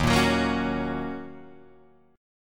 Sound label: Eb Minor 7th Guitar Drop D, chord; strummed